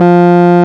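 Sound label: RMI piano F 2, EP; Sonic Reality - Vintage Timetraveler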